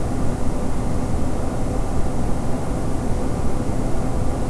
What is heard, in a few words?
industrial; de season